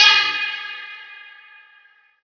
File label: metal, drum machines